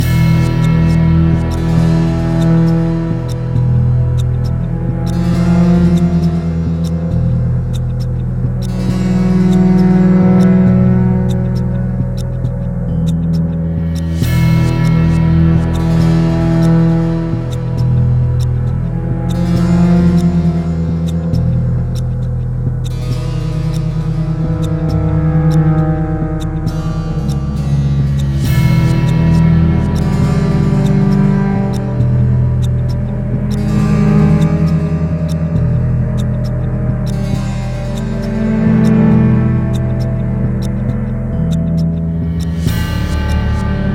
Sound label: 135 Bpm Loop Hiding; We Happy Few